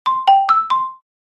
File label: marimba notification ringtone